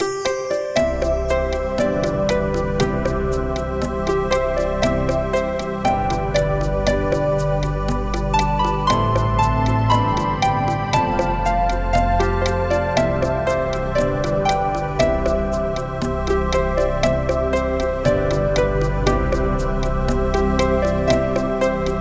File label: netherplace looping